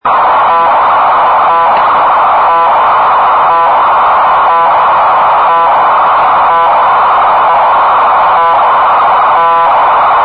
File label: 455 kHz; 455 KHZ DGPS AUDIO; I was tuning across the VLF bands one night listening to aeronautical NDB's when I came across this signal at 455 Khz.